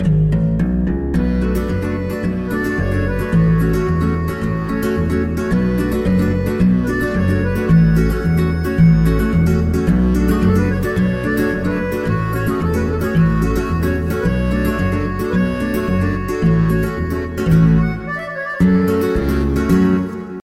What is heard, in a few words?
Nice Cheerful